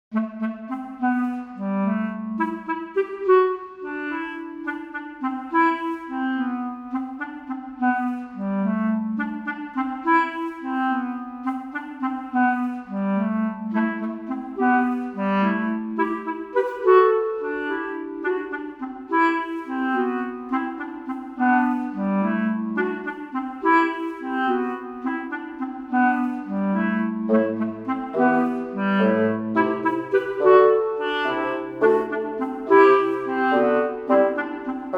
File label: woodwinds; Lucky Wheel; strings